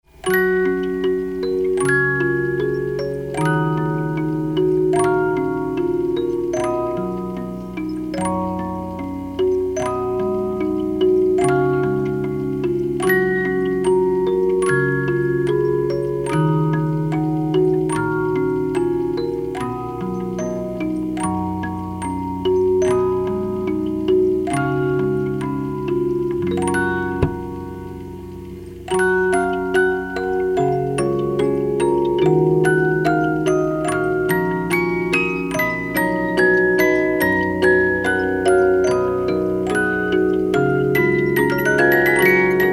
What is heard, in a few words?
Canon in D 3 Parts, 72 Note Movements